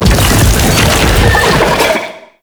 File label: Unearth Dig; Octopede; Warframe; ManInTheWall